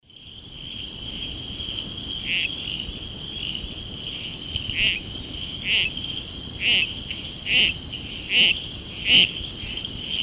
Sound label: Boophis viridis